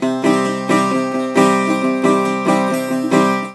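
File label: bouzouki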